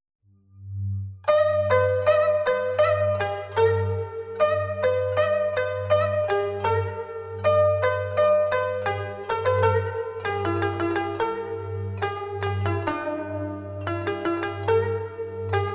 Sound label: Meditation